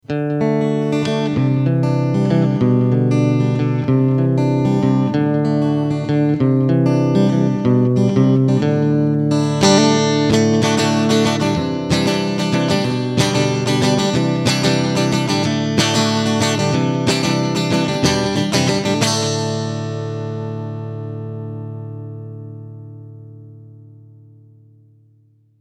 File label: acoustic; micro cube red